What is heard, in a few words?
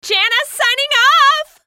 dying; Janna; Forecast